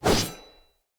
Short Sword Whoosh; Weapons; Enemy; Swords; Short Sword Elite

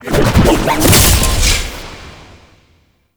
Jump; Technorot; Warframe; Infested Lich

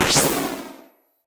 PvP Kill Points

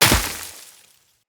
Land Veg, Footsteps; Characters; Tenno

